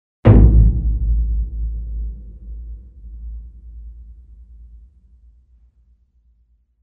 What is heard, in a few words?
Lower Tower Log